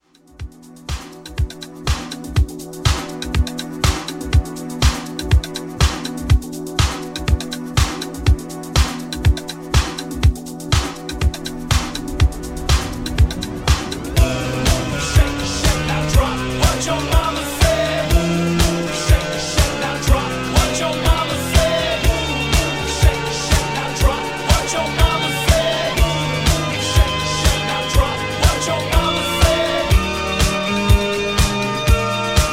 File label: 70s ReDrum Hype; Remixfreakz